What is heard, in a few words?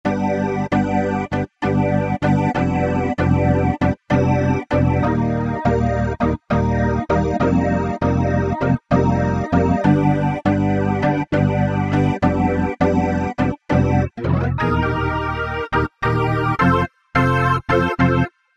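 Modern Organ; Generalmusic S2R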